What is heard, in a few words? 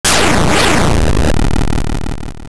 Boss Kill; Large explosion when a boss is killed